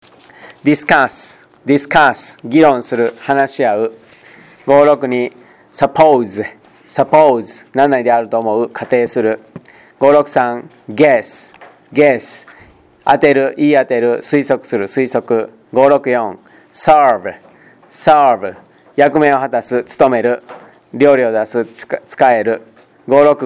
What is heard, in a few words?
tango